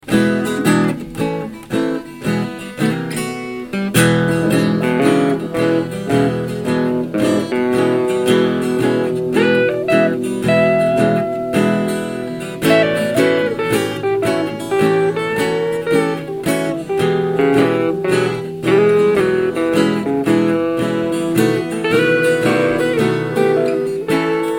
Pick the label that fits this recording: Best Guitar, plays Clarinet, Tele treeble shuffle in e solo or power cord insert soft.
Tele treeble shuffle in e solo